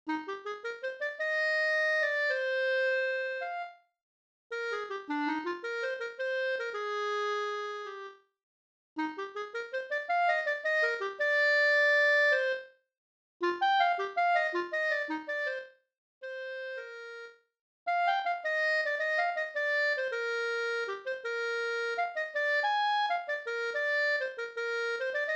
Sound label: for Clarinet; Bona 91